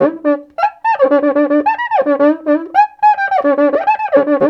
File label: Rio Batucada, 108; Spectrasonics; Supreme Beats; World Dance